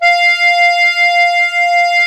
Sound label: DIATO2 F3, Historical Instruments; USB Soundscan vol.23